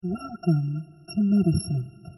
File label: octaves